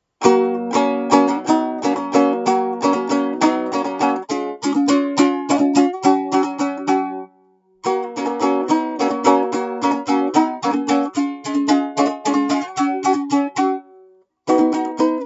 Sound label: Bandurka Domra alt 3str